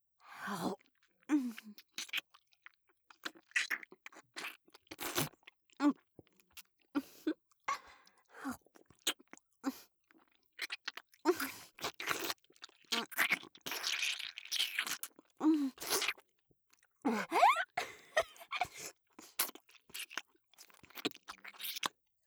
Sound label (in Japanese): BGV(フェラ); Ｗキャスト